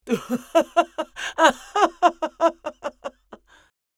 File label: Old lady laughter